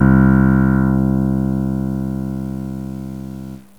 Picked Bass; GMTones

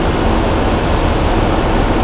ship engine room; SpecOps2 MPlayer Demo

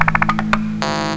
buttons; kingarth

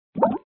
bounce sound; bubble t

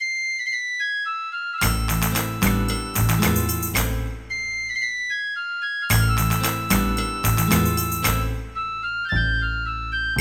FLUTE

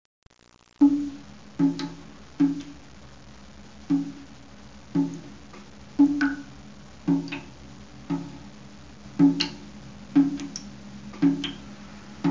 dip dip

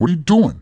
BUMPING; Messiah

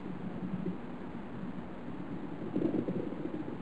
Electric; U-Boat 1.5.3

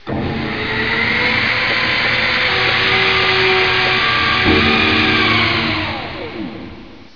drill; X-men

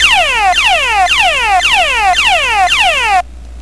New Level; Patriot Command; Arcade; Shoot

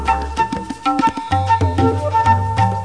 RHYTH, LOOPS

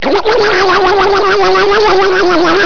SAW RUN

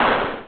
bullet Puff; F.A.R.M. Patrol; player

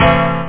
RIDE; Instruments

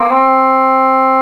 Bag Pipe; GMTones; Ethnic; Amiga 8-bit Sampled Voice

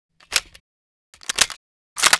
mp40 reload, weapons foley; Spearhead Demo; MacFormat 2003 June

